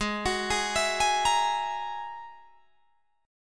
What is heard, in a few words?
Ab 7th Suspended 2nd Sharp 5th, Guitar, Drop D